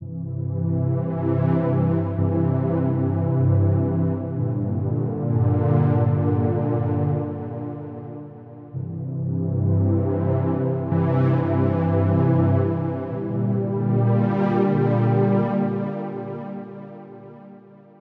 pad dry

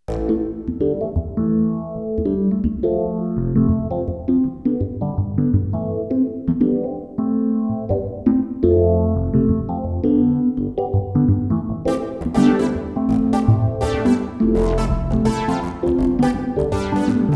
Groove Synth Large Chamber

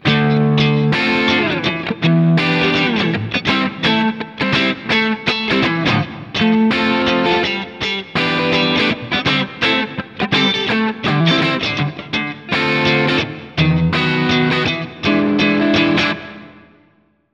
Groove Guitars Medium Hall

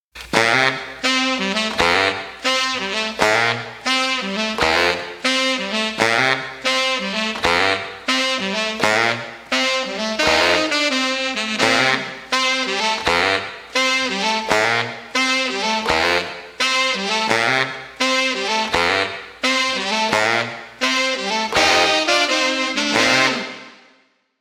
sax mix

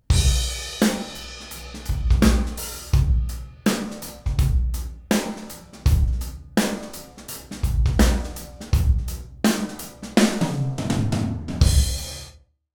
Groove Drum; Bigfoot

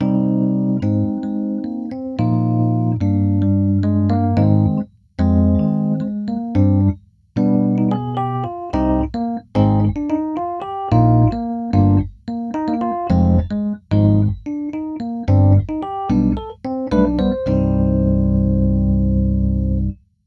vintage key dry

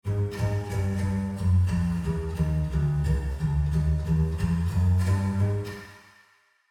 bass wet

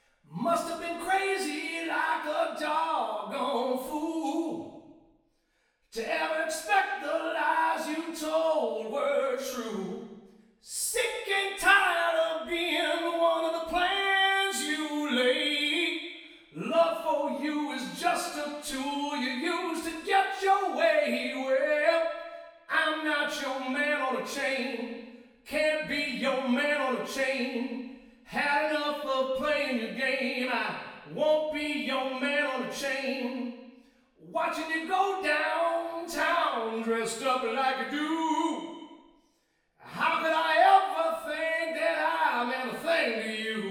male vox wet

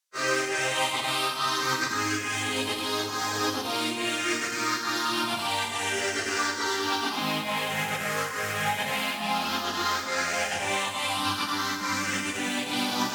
synth wet

